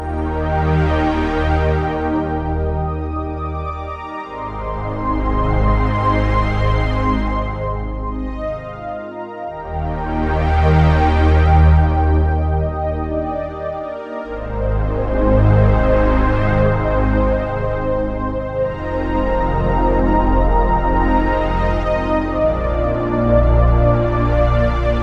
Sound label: Ultrapad Aftertouch Velocity Mod Wheel